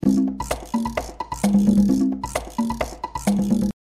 Merengue

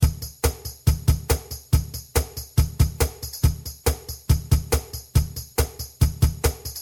Fast Rock

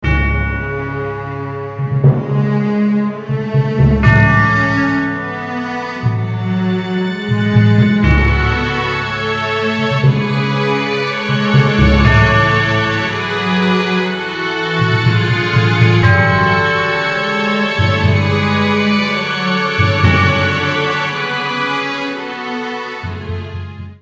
dramatic film score; songkits; Mixcraft

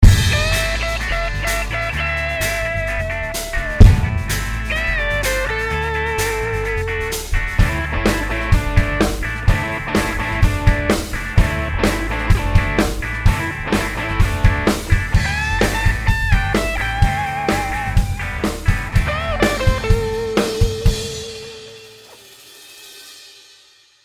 60s protest rock; songkits; Mixcraft

